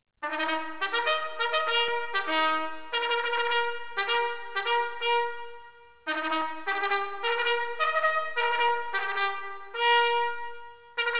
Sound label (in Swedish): retratt, signaler; dragoner